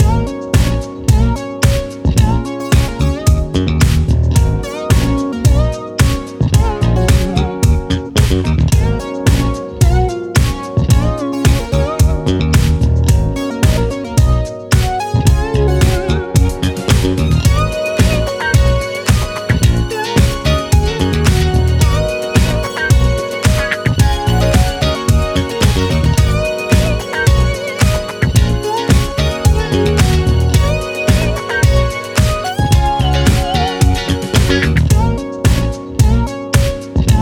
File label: Cooking Theme (110 BPM); Yum Yum Cookstar